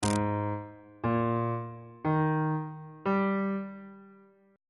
a flat major 7th suspended 2nd chord intervals on bass clef